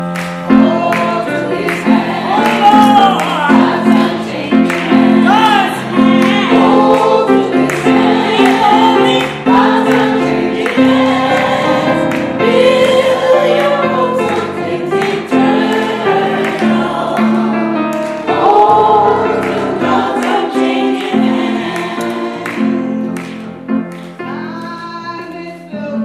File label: Gospel Choir